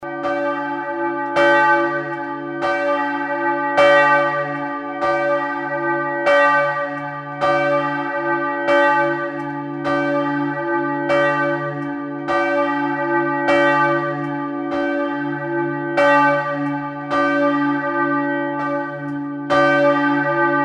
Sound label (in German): Glocke